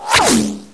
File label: near miss, weapons; hl2